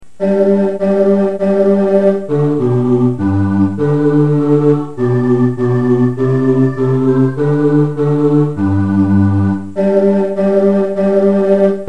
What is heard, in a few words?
hark herald bass; Christmas; carol tracks